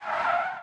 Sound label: skid short; Blind Drive